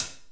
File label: Hat, Real Kit; A3 Cl; REAL LIVE KITS; Multisampled Real Kit BJ-HD9